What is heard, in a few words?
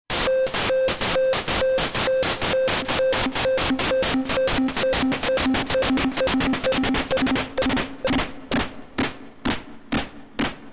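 midibox sid wavetable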